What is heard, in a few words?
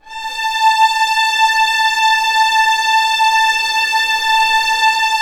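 strings A4 FF L; Sound On Sound - Peter Siedleczek's Orchestra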